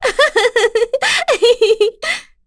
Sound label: Happy, Vox; en; voices; heroes; Yuria; kings raid